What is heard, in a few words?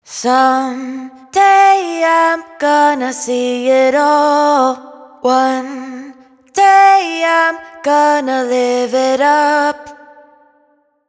hook 92 C wet, Vocals; Some Day; Slate Digital Omnivox Vocal Sample Pack